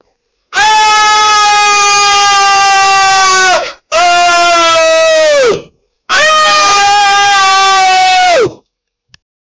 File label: a dead man screaming; death